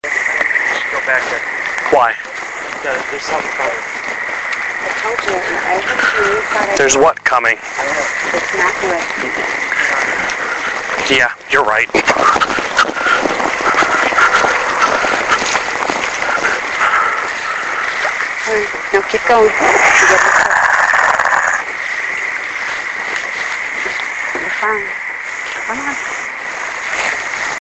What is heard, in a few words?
growl; EVP